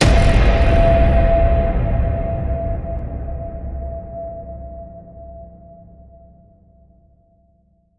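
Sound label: Hit Horror Scrunch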